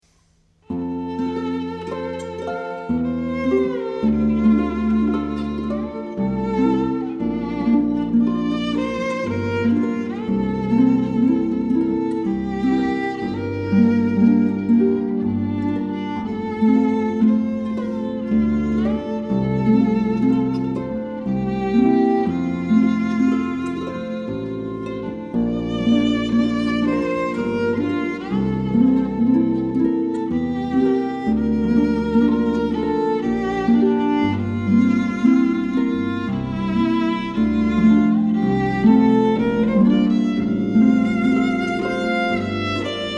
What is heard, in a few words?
Intermezzo w violin, harps; Cavalleria Rusticana